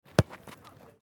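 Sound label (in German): Fussball Jugendtraining Schuss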